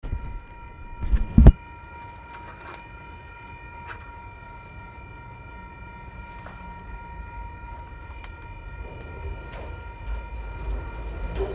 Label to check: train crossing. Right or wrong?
Right